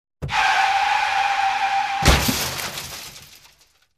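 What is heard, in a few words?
sounds of car crash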